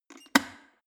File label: plastic water boiler close lid fast